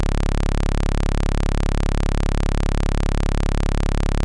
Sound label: pecker sound